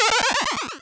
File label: Cry